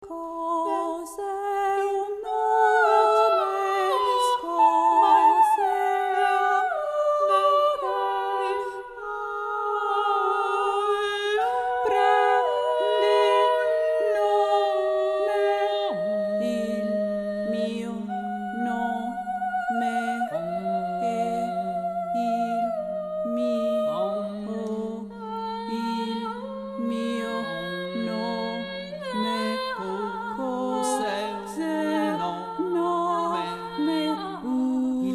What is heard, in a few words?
outdoors